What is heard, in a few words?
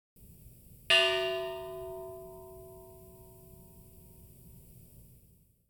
Church Bell